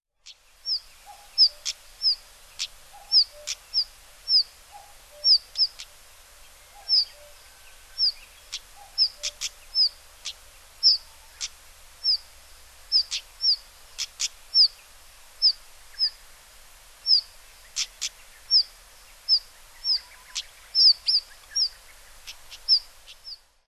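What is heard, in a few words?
Stejneger's Stonechat; nagano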